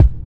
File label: Kick, Kickdrum; Equipped Music; Loungin House